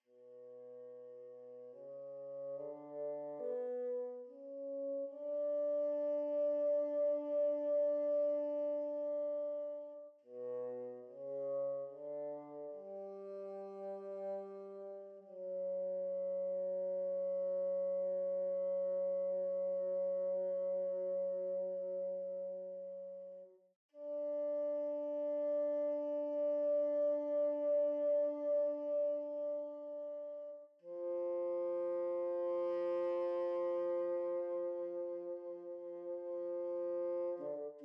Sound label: Bassoon